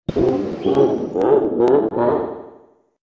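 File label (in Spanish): Risa Efecto de Sonido; Bowser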